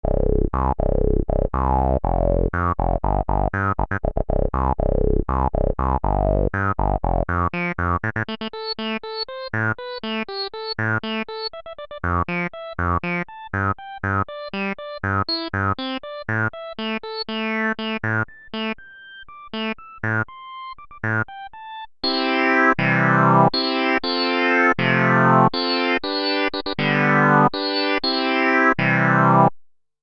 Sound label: e-mu virtuoso 2000 filter earlyrizer lpf 12pole q100